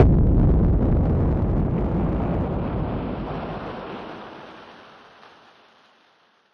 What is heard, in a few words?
Noise Bomb; musicradar; bomb factory